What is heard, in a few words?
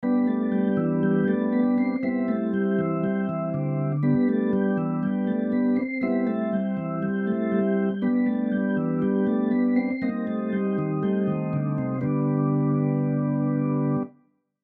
iv6 I cadence